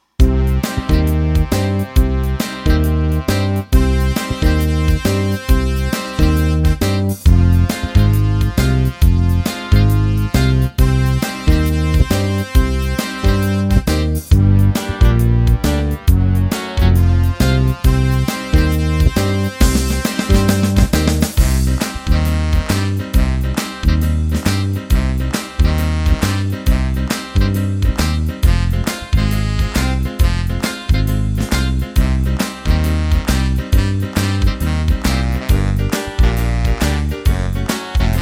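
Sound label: Shuffle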